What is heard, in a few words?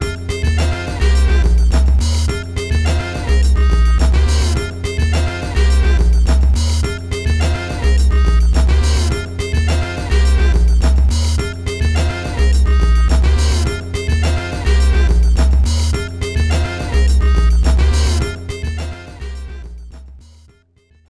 Jingle Draw; Panic Park; Namco System 23; arcade gamerip 1998